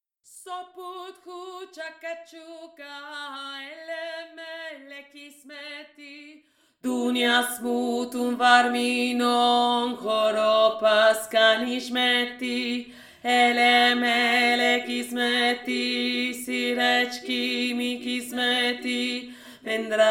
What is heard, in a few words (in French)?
VOIX 3 GRAVE